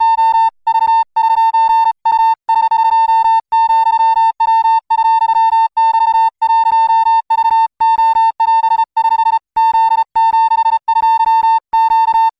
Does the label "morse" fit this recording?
Yes